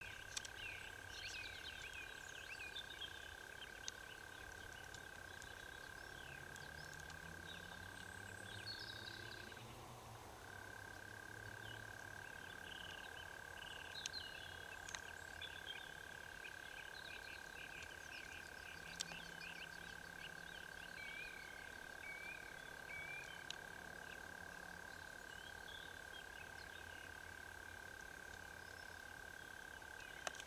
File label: Mole Cricket start-up from a distance